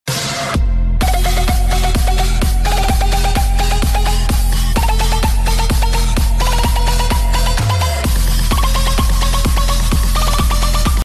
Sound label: house mix ringtone